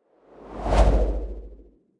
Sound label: whoosh impact soft wobble bass